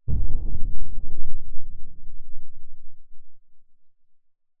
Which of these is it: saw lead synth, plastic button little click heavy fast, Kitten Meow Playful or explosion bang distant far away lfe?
explosion bang distant far away lfe